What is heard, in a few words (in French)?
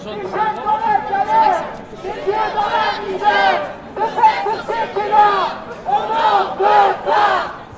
chant